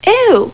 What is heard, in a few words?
eugh; buffy